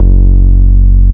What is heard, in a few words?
Deep House, baesse